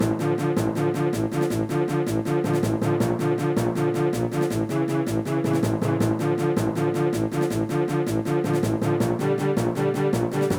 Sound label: Forest Terrors loop